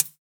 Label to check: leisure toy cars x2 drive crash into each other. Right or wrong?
Wrong